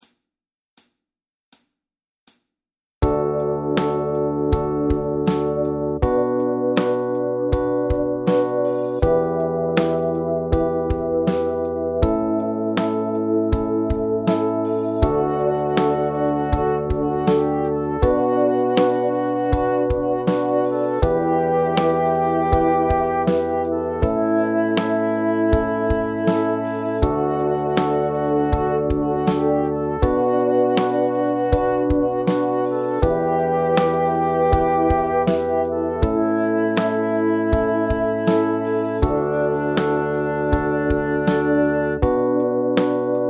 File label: Bass D6